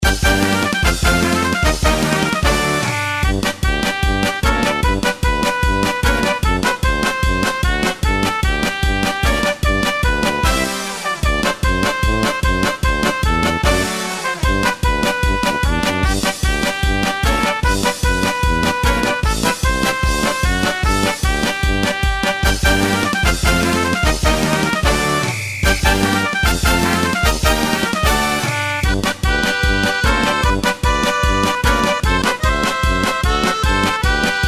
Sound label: O, Tannenbaum (Karaoke Version)